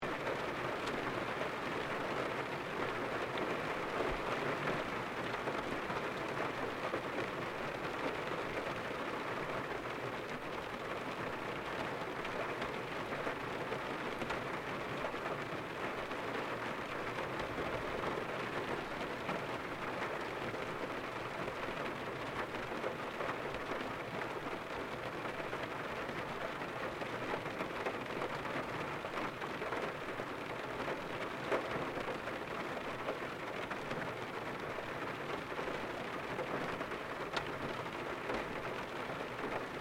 RAIN MEDIUM CAR INTERIOR LOOP STEREO, Rain recorded from a car interior; Weather